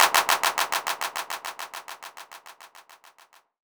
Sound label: clap 2 FX A3